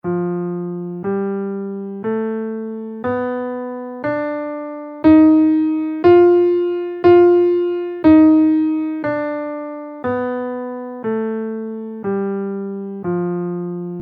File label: prometheus scale in F